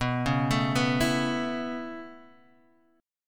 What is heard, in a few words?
B 7th Suspended 2nd Suspended 4th down, chord